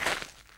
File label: STEPS Gravel, Walk